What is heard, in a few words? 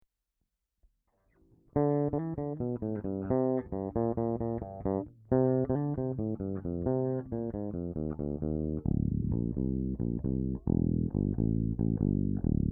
whammy drop tune